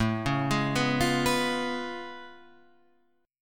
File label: A Augmented Major 9th A down Guitar Standard; arpeggiated